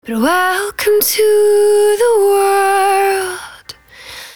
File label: 110bpm Ab, Velvet Vocal; Zero-G